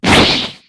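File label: reveal object; breakdown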